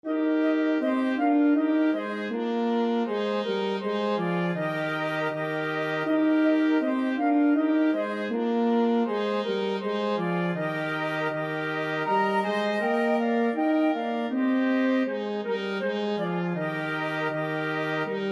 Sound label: flexible mixed ensemble 4 players